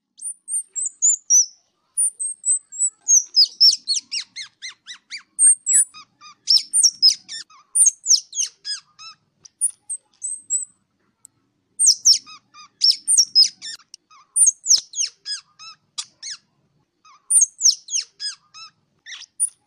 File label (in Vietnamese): tieng soc dat keu goi bay